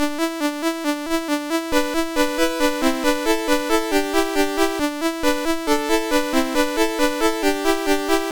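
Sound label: Robby, MOS 6581 SID chip music, games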